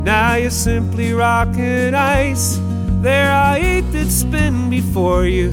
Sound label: music; wu xing; earth